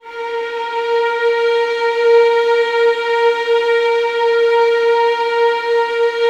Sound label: Violins, A#2, Classic Orchestra; Best Service ProSamples vol.51